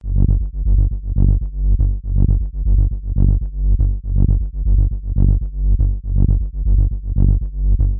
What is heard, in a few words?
HOOVER; Studies in MASS